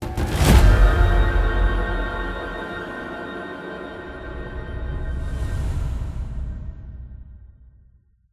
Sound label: Game over